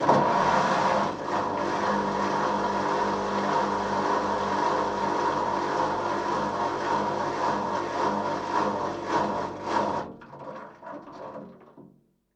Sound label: Short Motion, washing machine; Wash Spin Cycle